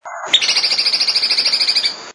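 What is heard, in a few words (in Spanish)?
voz carpintero bataraz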